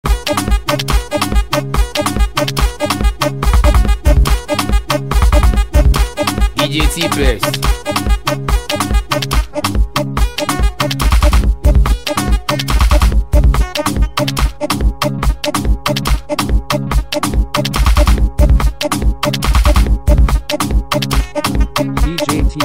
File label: Hausa Northerners Beat